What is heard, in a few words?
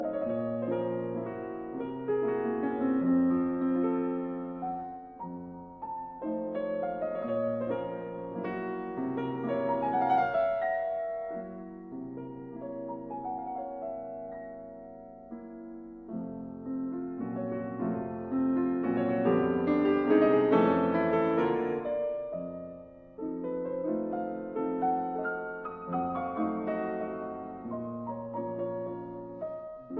Mazurkas in B flat minor Con moto; 10 Mazurkas Op 3 No 8